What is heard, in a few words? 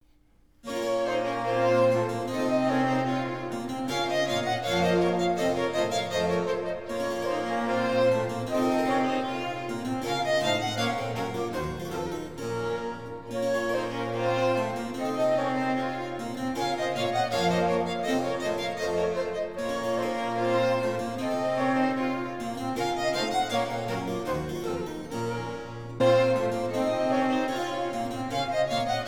Overture in B Minor Rondeau; Johann Sebastian Bach; No2